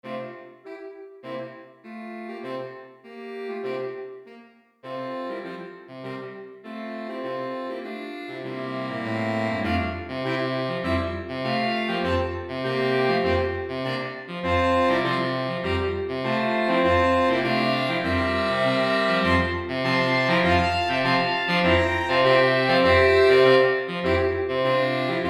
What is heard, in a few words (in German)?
saxophonquintett